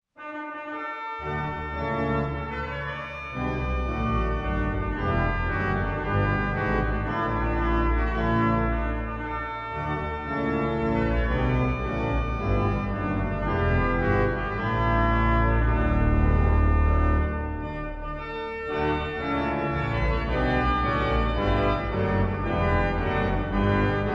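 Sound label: tuba tune, organ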